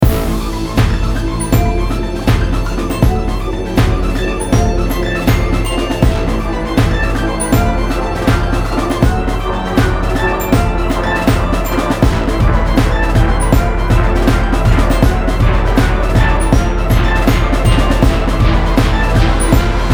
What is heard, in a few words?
Battle Boss; Fake Princess